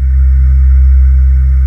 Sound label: VOICES C2, Choir; USB Soundscan vol.28 - Choir Acoustic & Synth; AKAI